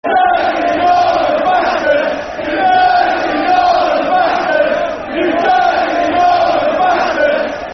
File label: Football Chant, Brighton Fans; Dirty Northern Bastards (Brighton Fans); Brighton Bhafc